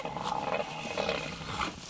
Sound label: gruff squeal; gruff-squeal